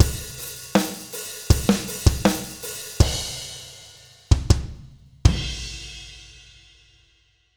sd black kit; eastwest qlso

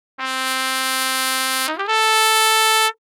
sp penta, Trumpet; samplemodeling

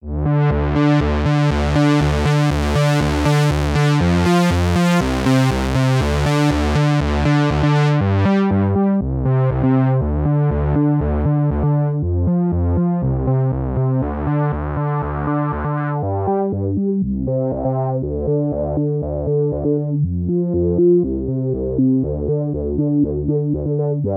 mopho pwm 80s filter evol; davesmith mopho

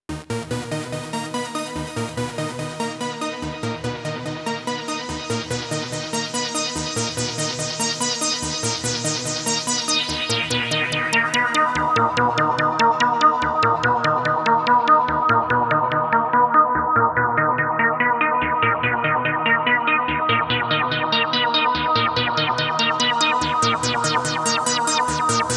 mpc5000 arpeg filter control; akai

